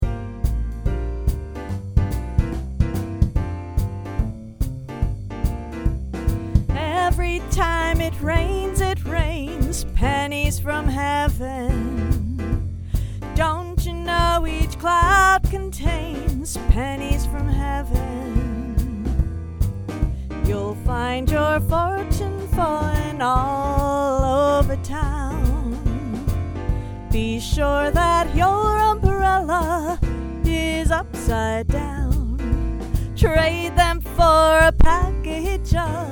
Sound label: vocal pennies, gentle jazz